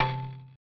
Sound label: Marimba; Super Mario Bros. Remastered